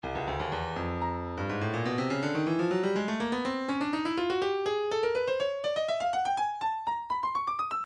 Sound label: percussion congas 093 congas; Stylus